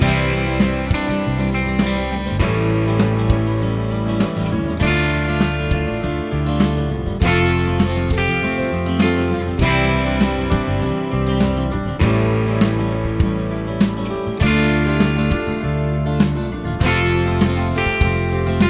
progs with applied chords

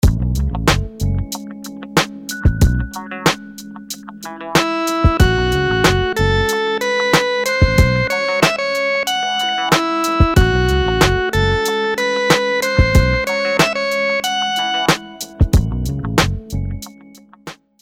rhythms dotted 8s

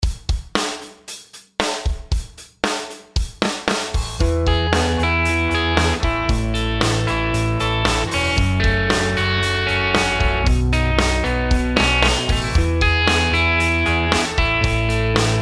chord progs maj root; harmony

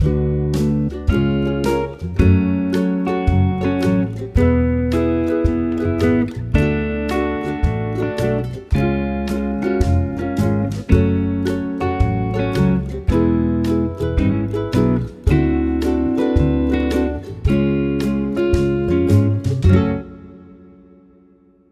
minor key chord triples, F sharp

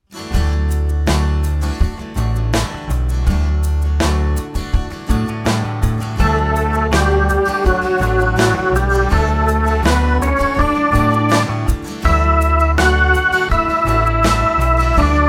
melodic dictation

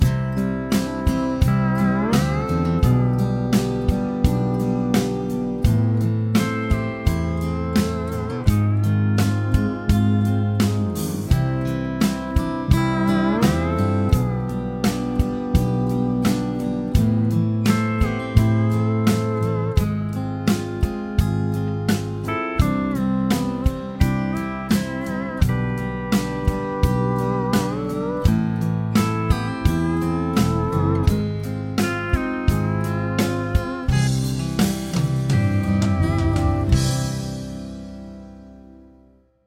chord prog with mixture

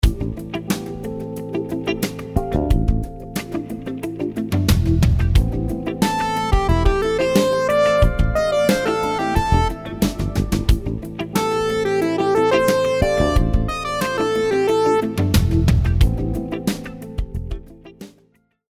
rhythms 16s syncopated

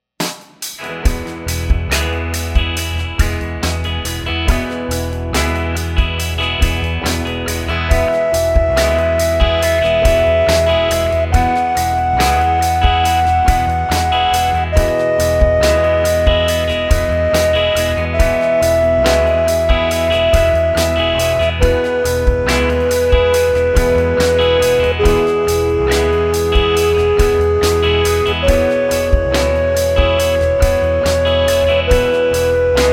scale degree

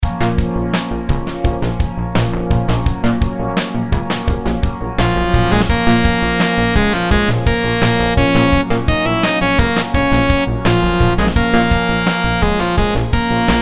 rhythms simple dotted 8s 85

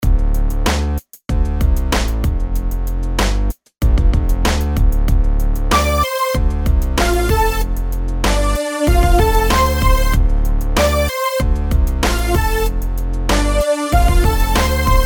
rhythms eighths; simple 8s

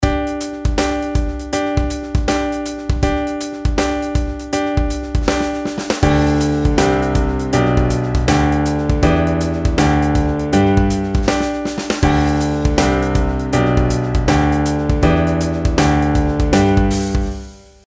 pitch patterns skipwise

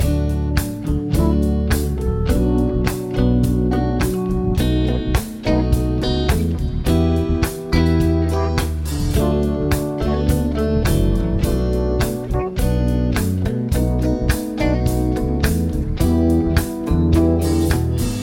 progs with applied chords

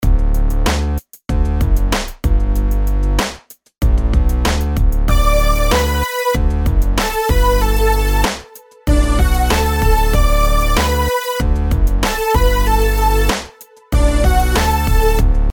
rhythms simple 8s major

